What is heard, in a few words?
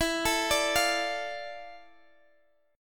D Minor E, chord; Dm/E arpeggiated